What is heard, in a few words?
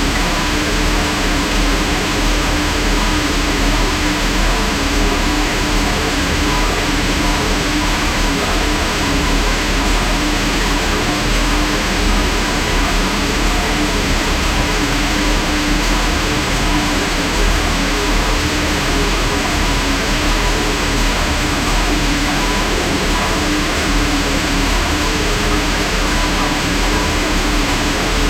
a deep horrifying industr, grinding metal